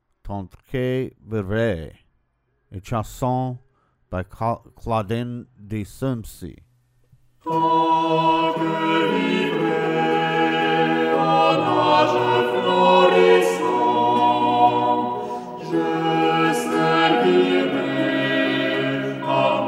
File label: chanson; Claudin de Sermisy; Tant que vivray